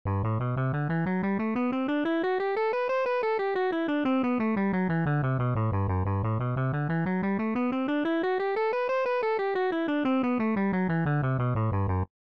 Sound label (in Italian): scala maggiore SOL tre note per corda